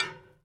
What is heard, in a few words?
Tube Hit With Metal Object Softly